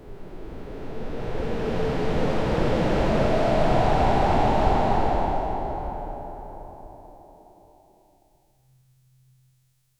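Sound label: a soft clean whoosh transition, air rush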